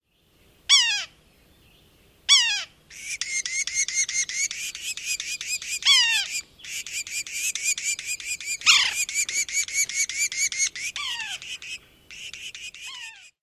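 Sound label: mews, nestling calls, and churr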